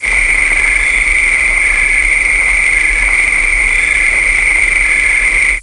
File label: Crickets